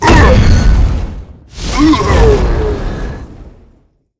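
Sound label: guardian Anubis death, monster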